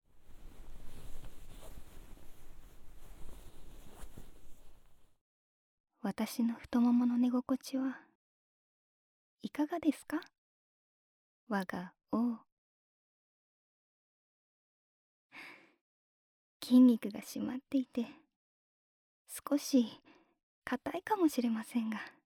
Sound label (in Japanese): 膝枕 で 耳かき（右耳）